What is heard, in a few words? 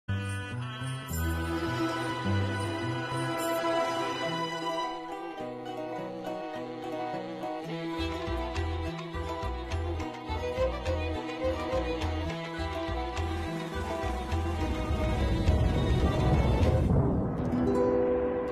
movie ringtone telugu bgm background music